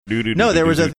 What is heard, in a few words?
singing underground theme; super mario